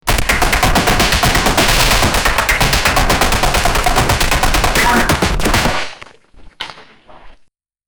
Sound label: massive battle; action-packed